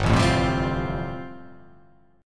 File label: G 7th Suspended 2nd Suspended 4th